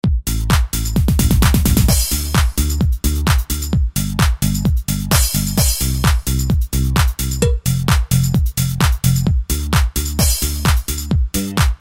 tr-909 stereo

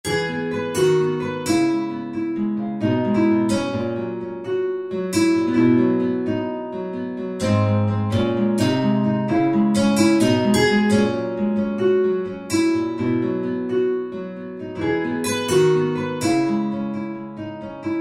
guitar ensemble stereo; ramirez

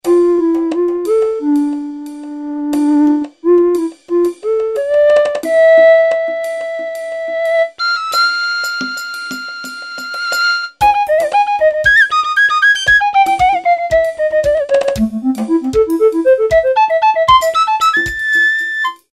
flute bengalian

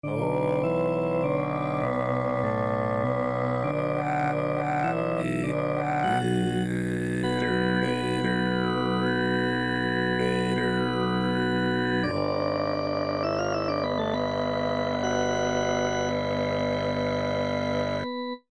Kargyraa

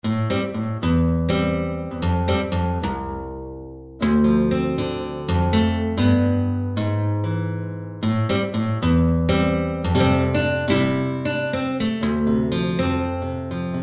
guitar normal; ramirez

